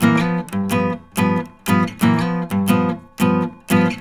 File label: riffs, Blues Guitar; ILIO; Fretworks